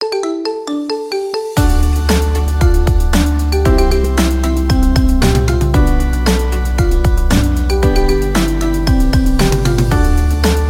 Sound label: marimba remix